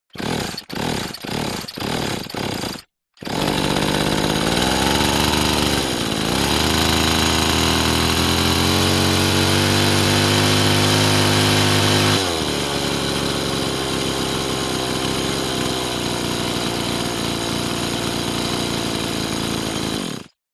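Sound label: industrial petrol powered power tool false starts then start run off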